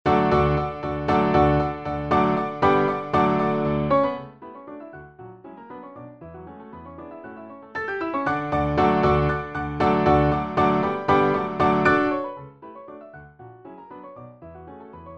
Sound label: Piano Concerto